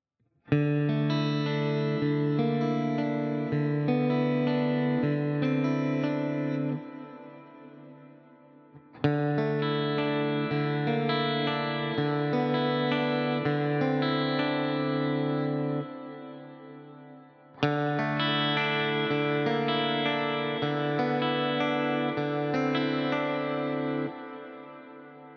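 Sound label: ESP LTD TE 1000 Clean, guitar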